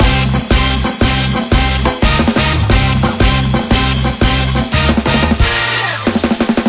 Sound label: bgm guild battle battle intro; Girlfriend (Provisional) Gorgeous Voice; Android game music